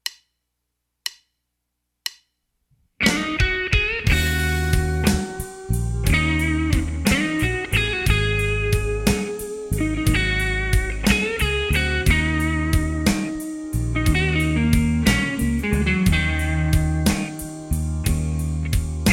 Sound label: Lazy Day Blues